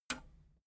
metal button radio release enable

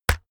keyboard external key hit push

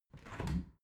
window open regular

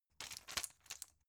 plastic blister handle

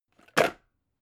plastic bowl stack

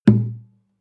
western guitar drum single tonal

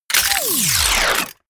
wind down

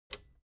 plastic button small latch release

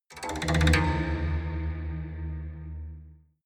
haunted strings roll low

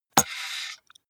computer mouse draw drop hard

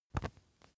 match shake single

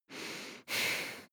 breath female heavy calm down

